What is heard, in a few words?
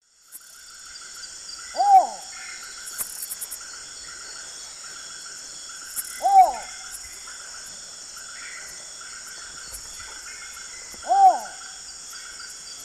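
Ixobrychus exilis, call playback; S. America; Eastern Lowlands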